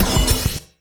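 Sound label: Bello Oneshot, beats; Sonic Foundry (Sony Creative Software); Esoterik Beatz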